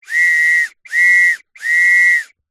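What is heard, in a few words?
whistle two tone x3 medium blows close up; foley; zapsplat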